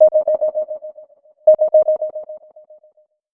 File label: Sonar, alert tones; Ring